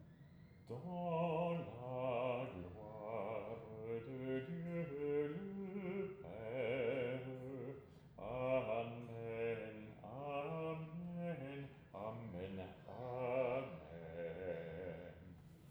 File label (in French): Basse